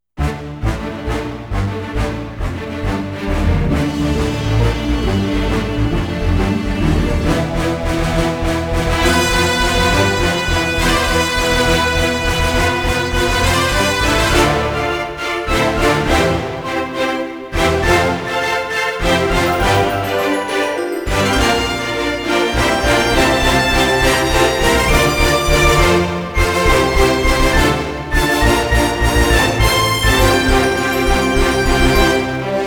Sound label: no percussion